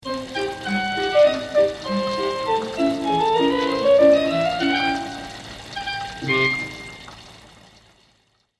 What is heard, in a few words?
JINGLE NOLOOP; JACK ALONE